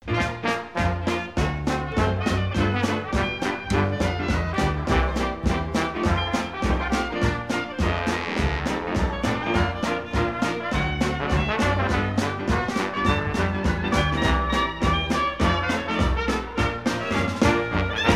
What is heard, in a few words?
beef interlude instrumental